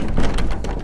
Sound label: metal stress